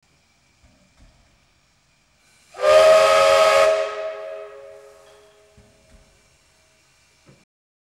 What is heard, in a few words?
Steam Train Horn